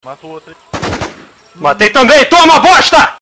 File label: ring